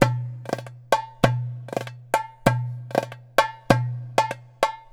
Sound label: Clay Dumbek, Ethnic Percussion; Sonic Foundry (Sony Creative Software); Ambient Atmospheres & Rhythms